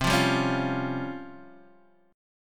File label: Db Major 7th Flat 5th C